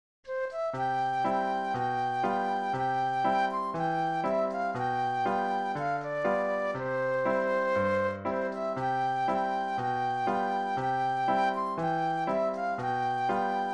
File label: flute solo; patriotic